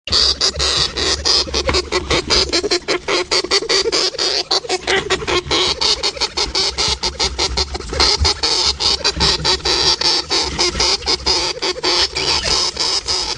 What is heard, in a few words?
hamster in a jar